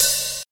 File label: open hat g key, Royality free open hi hat tuned to the G note. Loudest frequency: 7489Hz; drum single hits one shots